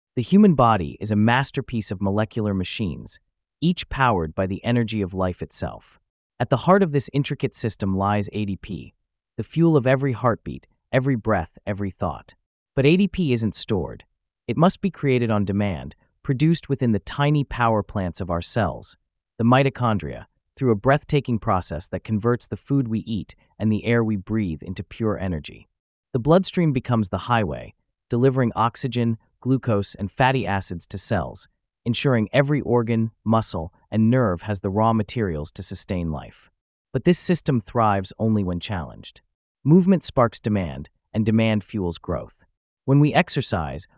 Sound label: Exercise the Heart Beat